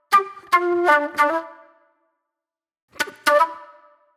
Flute, Disco

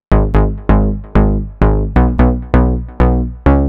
Bass, 130bpm, Nu Dance Electro; Zero-G; Soundclash; Combi Loops; Insania

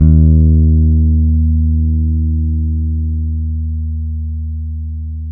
FLSW D#3, Bass; Spectrasonics; Bass Legends CD 1

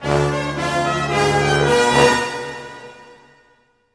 brass accent; Orchestral Loops

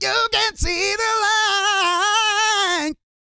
GOSPEL; Vocal Planet Vol1 Gospel

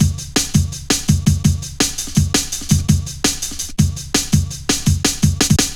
Fat Rolla, Jungle Drum Loops 166.5

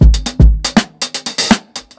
120 16TH GROOVE, DRUMS LOOPS; BASEMENT DRUMS VOL 2

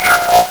LSDj Animals, Nintendo Gameboy; 300 Drum Machines

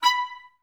STAC B4, Orchestra; Best Service; Peter Siedlaczek's Advanced Orchestra

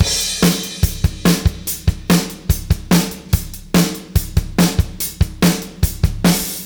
Beat Loops; Zero-G; Monster Beats

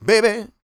SING, Vocal Planet R&B & Dance; ILIO

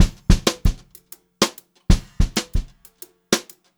BEAT, Brushed Drum Loops

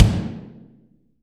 BD, Real Mega Drums

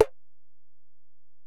Tom, Alesis DM-PRO

